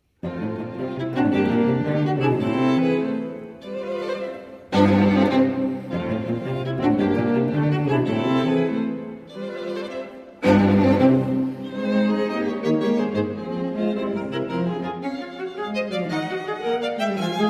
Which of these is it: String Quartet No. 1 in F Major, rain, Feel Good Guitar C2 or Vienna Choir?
String Quartet No. 1 in F Major